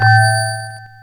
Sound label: Bone Rollercoaster Activate; New Super Mario Bros. Wii